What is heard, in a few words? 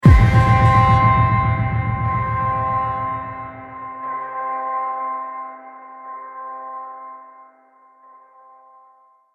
Cruel Astral Accent Trailer Hit; Trailer Sound Effects